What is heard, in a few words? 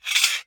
Sword Draw